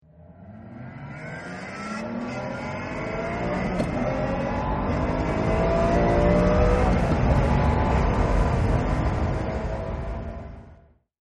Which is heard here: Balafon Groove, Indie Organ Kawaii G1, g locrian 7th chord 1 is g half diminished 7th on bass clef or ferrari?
ferrari